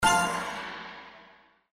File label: SFX LANDED WILD; Wealth Gods2